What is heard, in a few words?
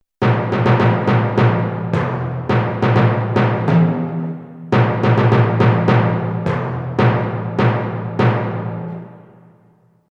timpani; instruments-of-the-orchestra